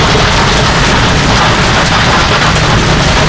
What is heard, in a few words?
gravel roll int wet, Kart Tires; AOS RF1 Kart Demo